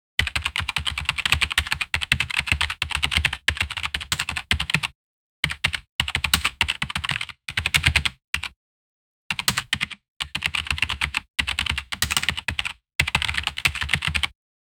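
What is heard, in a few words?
futuristic keyboard typing; interface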